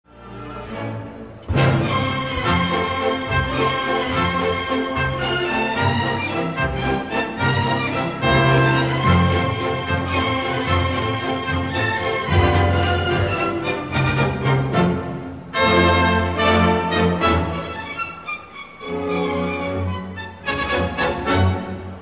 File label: Classical